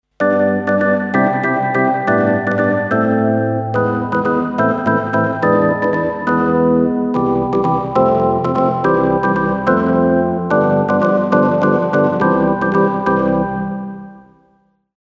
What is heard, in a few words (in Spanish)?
órgano, guitarra, bajo y sintetizador